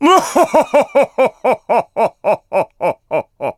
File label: Laugh; King Tuft laughing